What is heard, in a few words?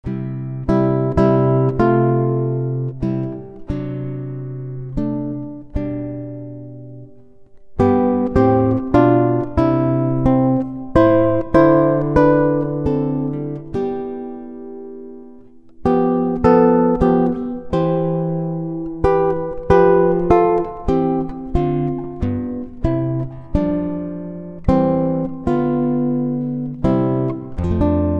Hymn Tune